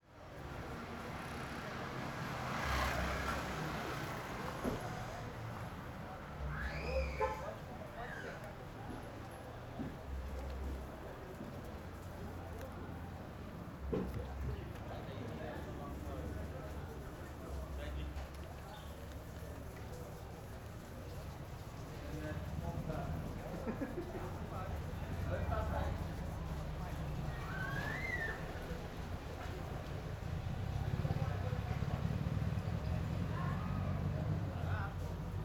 Oficina mecanica sons metalicos vozerio moto buzina serra; sons de metal

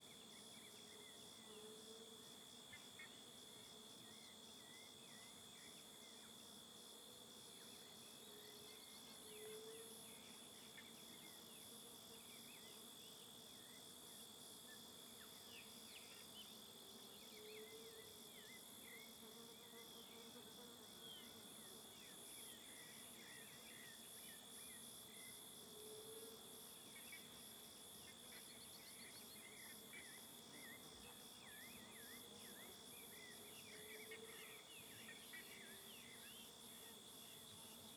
Coro de cigarras, grilo constante, mosca, passaros juriti no vale; Cerrado; insetos; colecao sonora do cerrado

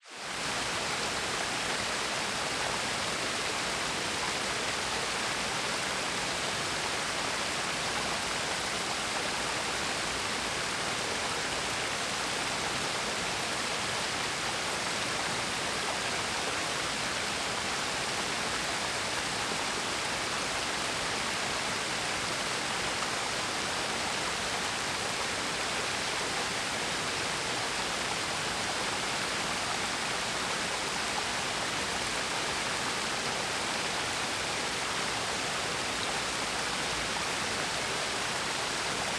Cachoeira do Canion do Raizama por Cima

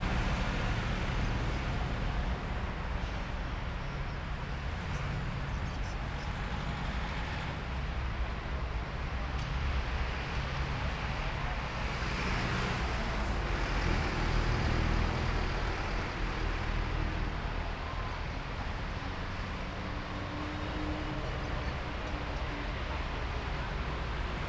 Transito com bastante onibus

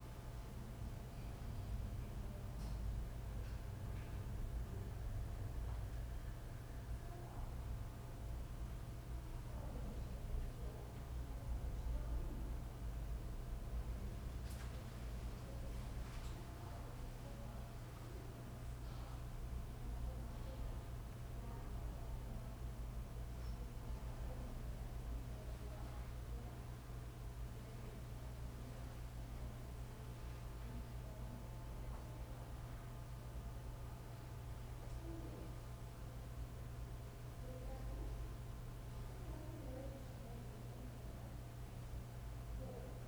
Ambiencia sala com projetor e voz feminina longe

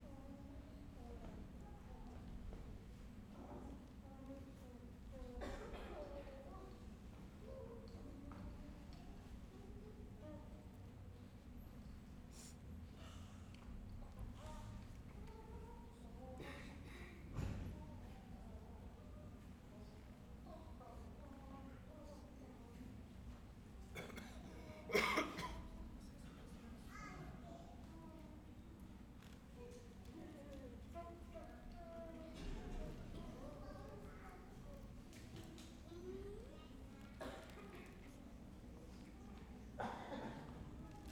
Apresentacao muda com plateia tossindo e crianca cantarolando; multidao

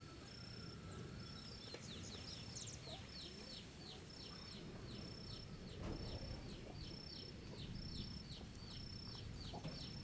Ventando nas arvores em ambiente rural com ranger de madeira, galo cantand; grilo e; galo cantando

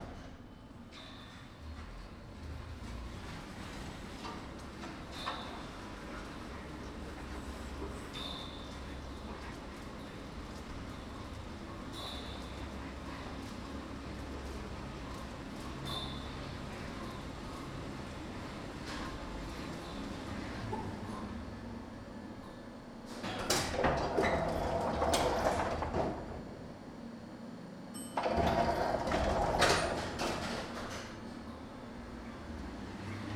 Mecanismo de elevador em movimento Foso; maquinas

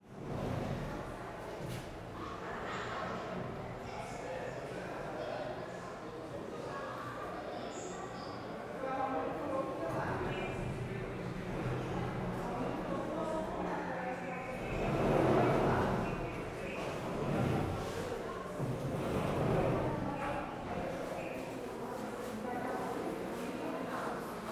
Ambiente no corredor de hospital publico vozerio passos arrastranto objeto; multidao; Colecao Sonora do Cerrado

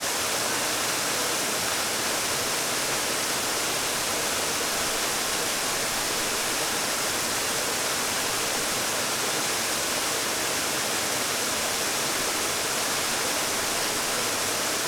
Parque terra viva cachoeira perto da queda dagua

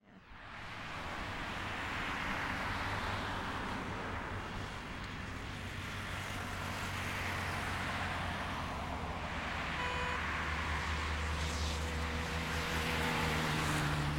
Ambiente no Eixo Monumental muito transito musica

